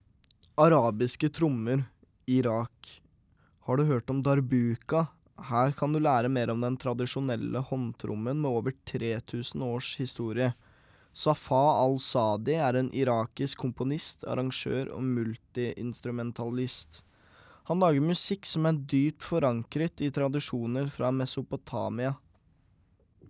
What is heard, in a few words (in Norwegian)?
Arabiske Trommer